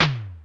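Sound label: Electric Low Tom, Yamaha DD-35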